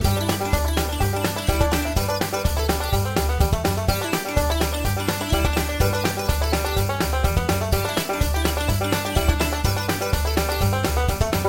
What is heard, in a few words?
music